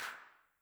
HAND CLAP Reverb Pitch, 909; classic machines

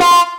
acid synth (F); Sonic Foundry (Sony Creative Software); Ugly Remnants VOL-2

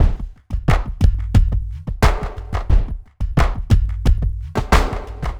tribalize, Loops; Distored Reality

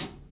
HAT

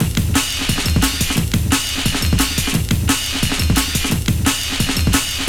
Rugged Break, Drum & Bass, Beats & Breaks

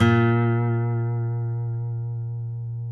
COLLINGS A#2, Guitars; Spectrasonics; Hans Zimmer Guitars VOL-2; FNGSTYLE PFM

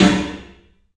PA DEEP L, CLUB SN VRB; 3D Sound; 3D Drums

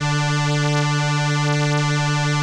ELKA, String Machines; Best Service; XX Large Most Wanted 2 String Machines

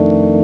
EP Old Roll; Proteus 2000; Digital Sound Factory Proteus 2000

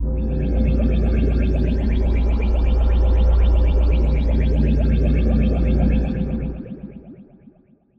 Bubble Atmos, cinematic drama Soundbeds; musicradar

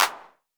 PERC 909; Wizoo - Platinum 24 Electronic; CHR PERC 909

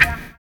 FUNK; Univers Sons - Kitch 70s

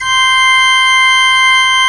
SCHARF C4, SOLO OR; AKAI S-Series CD-ROM Sound Library VOL-2

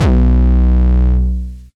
808 Dist - B1, Kicks; Mbase 11 Kicks

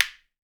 WOOD SPOON; Jew's Harp & Spoons

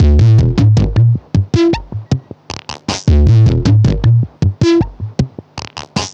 Modular Loop, Modular Percussion

